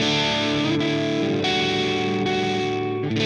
Silos Lead Guitar Riff, Indie Rock; Sonic Foundry (Sony Creative Software); Indie Rock Anthems; Session 11 - Silos

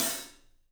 ZILDJIAN HT; 3D Sound; 3D Drums

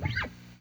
JAZ NOISE, guitar; Quantum Leap - Guitar & Bass

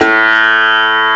sitar; CMI Sound Library Rev 1.3; GUITARS

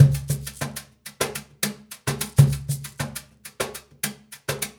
Cajun, Percussion, Drums; Essential Percussion

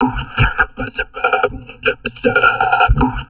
TALK, Drum Loops Crazy Processed

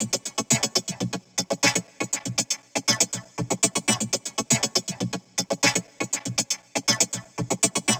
Syn Loop 120; Equipped Music

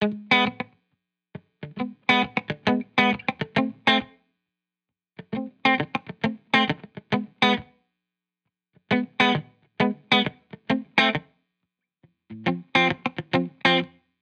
Guitar Bpm135 Key E7, 70s Guitars; Fox Samples - Back to the 70s Guitars

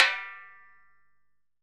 Repique Rimshot; Best Service; Festa Latina VOL-1; Single-Hits

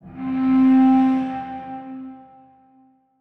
Violin 3 - C; Instrument Oneshots; 99Sounds; Monosounds

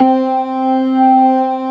ORGAN, NO PERC; C3; Keith Emerson Signature Series

